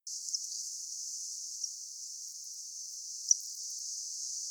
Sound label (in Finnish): junkkolintuko amerikasta ilmeisesti